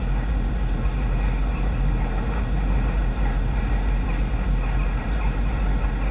Ambience Loop, outdoor

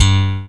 FACTOR SLAP G, BASS; Greytsounds

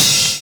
END ROLL; Univers Sons

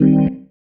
Stab One Shots, Organ

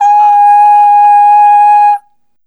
Ooh Short G#4 Dry; Harmonic Building Blocks

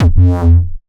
bass tweak phase F; Polyend; ARC Noise

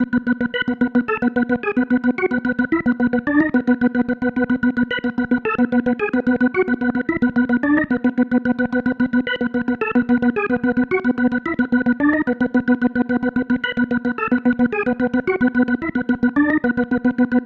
Psychedelic, Organ, Loops

